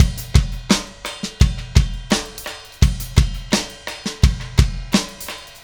Static Beat

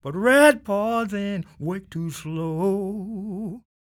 SING, Blues Soul; Spectrasonics Vocal Planet

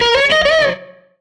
Strat Guitar Loop; Sonic Foundry (Sony Creative Software); Six-String Orchestra

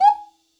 kit, Yamaha Motif-ES8

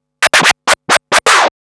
Scratch Fills 140BPM

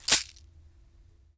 Berimbau Shaker, World Percussion; Sony CS

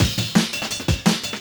Outside The Box 170, Drum&Bass Breaks; EZ Rollers

